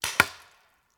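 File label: Paintball Gun Impact; Sound Ideas; Guns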